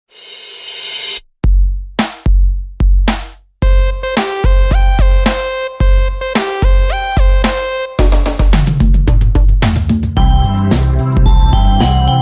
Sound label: Karaoke; KARAOKE MAN; Head Shoulders Knees Toes